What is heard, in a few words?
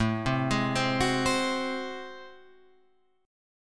A Minor Major 13th A, Guitar, Open F; AmM13 arpeggiated; 9,7,8,7,9,7